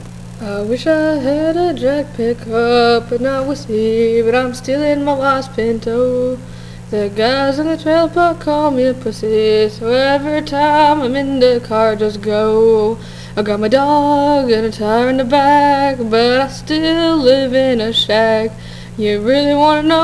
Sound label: music; timbuktu